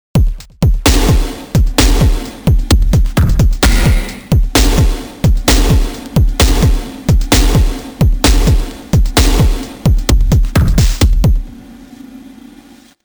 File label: Kit Groove Rock